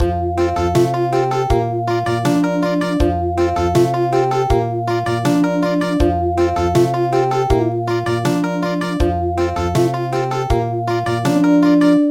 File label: Country; Ljulja ljulja ljuska